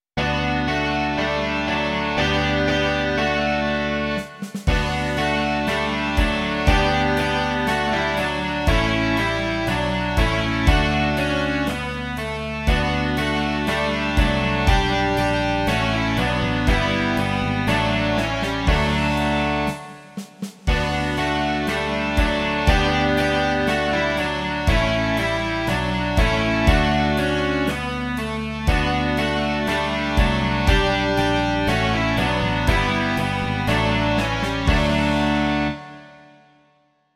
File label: rock